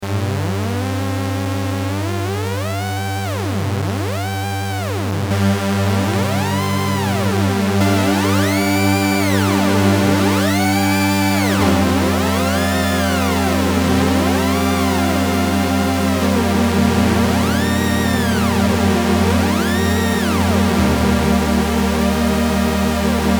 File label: screaming drone